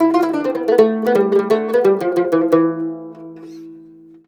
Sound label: CUMB, CUMBUS, Mediteranean Traditions